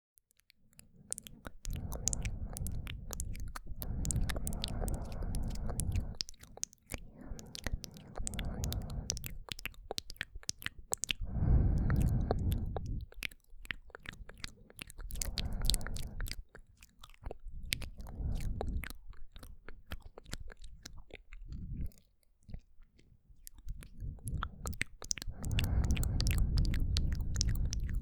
心地良いマウスサウンド

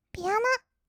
ピアノ; 色々なワンショット; 1200 kawaii voice!!

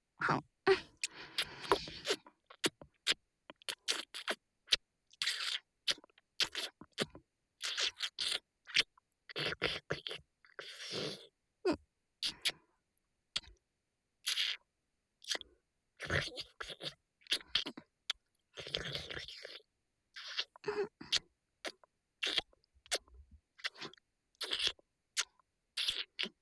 フェラ(口交)

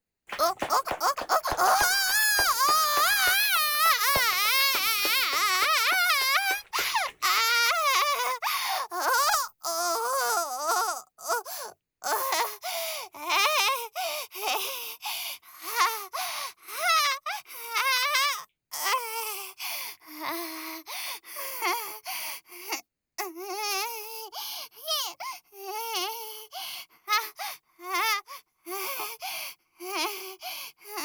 絶頂（SEあり）高潮

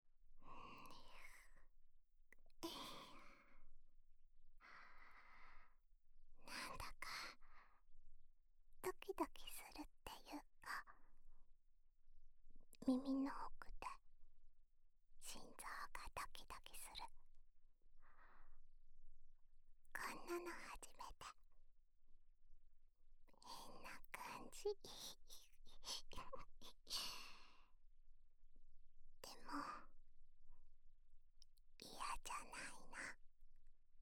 耳舐め; いつまでも、お兄さんの耳なら、舐めてたいの。

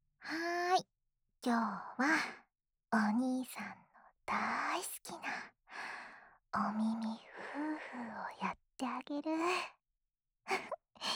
安眠用 お耳 ふーふー （左側）; ASMR

